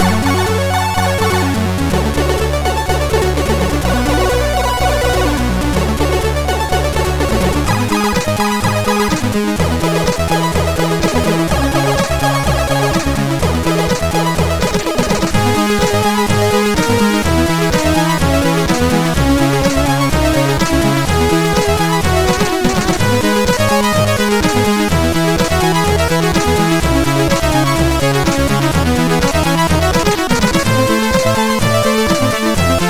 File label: sid, MOS6581R4